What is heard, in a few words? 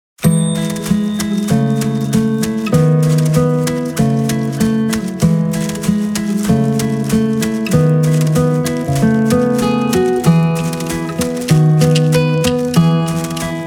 En Rondeau, guitar; Guitar Calendar A Guitar Suite